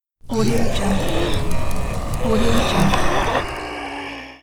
fire against zombie

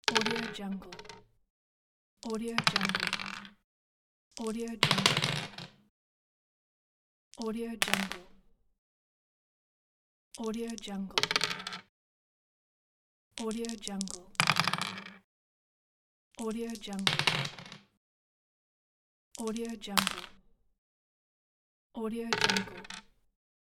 dice

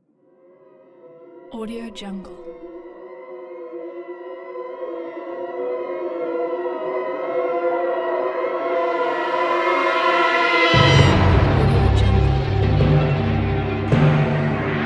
horror haunting rise ident

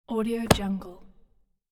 book closing

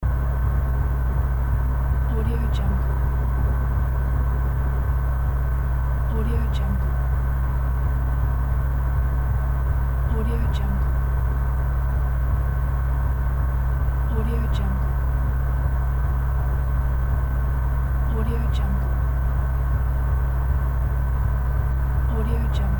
boiler room ambience

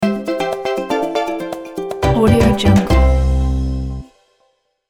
tropical summer logo

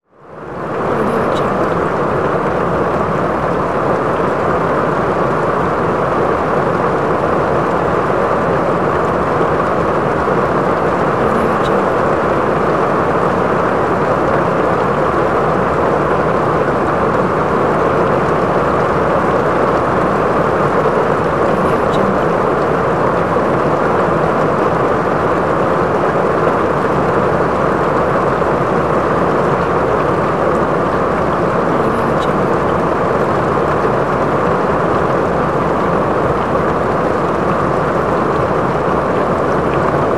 rain from interior

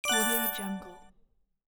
bonus

